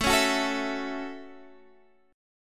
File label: A 6th, Guitar Standard, chord; strummed